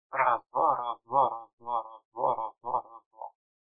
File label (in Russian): чистый звук